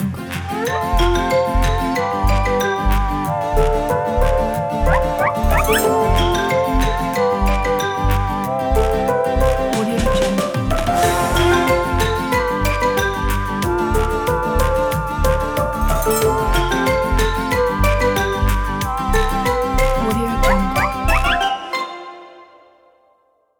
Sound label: cartoons ident